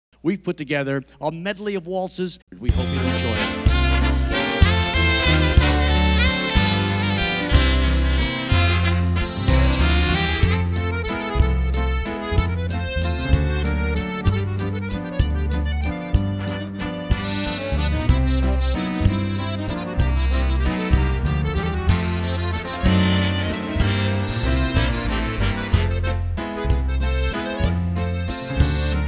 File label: Waltz Medley